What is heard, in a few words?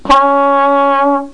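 flugelhorn, notes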